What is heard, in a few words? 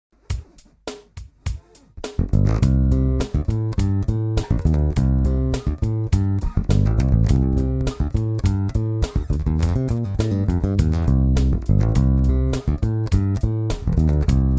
C major bassline with country fill